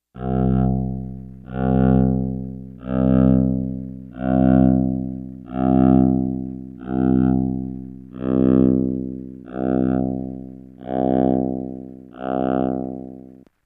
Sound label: cello